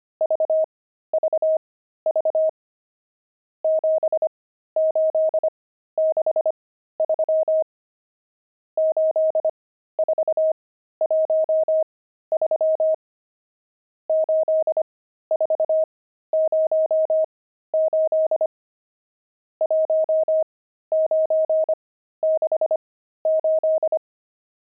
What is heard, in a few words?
pangram, intermediate pangrams files